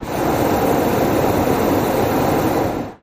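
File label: Hot Air Bllon Burn Me, Hot Air Balloon Burner; Medium On, Off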